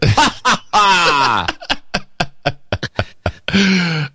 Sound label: laughter; noagenda